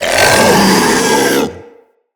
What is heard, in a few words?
Infested Femur Death Vocal